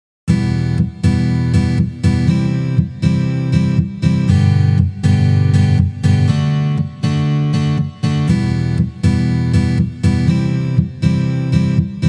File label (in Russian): Boj Shesterka s priglushkoj